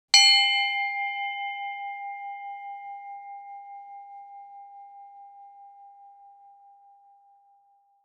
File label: Handbell single ring